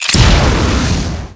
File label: electric explosion, labs; Half Life Model Pack; Half Life 2; ambient levels